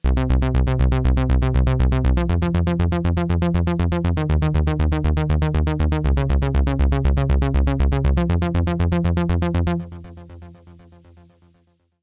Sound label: Roland Cloud Juno-106